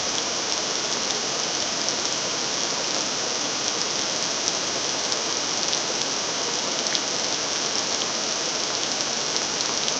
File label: artificial rain sound